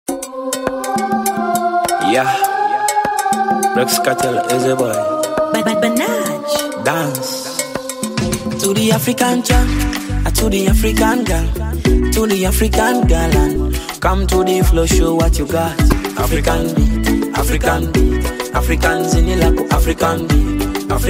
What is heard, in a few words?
African beat